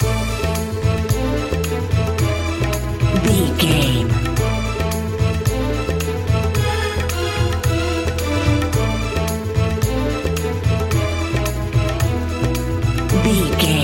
asian music bed, An exotic and colorful piece of World music. Groovy, powerful, exotic and wild